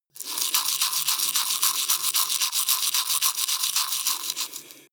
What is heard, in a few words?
teeth brush dry open circulate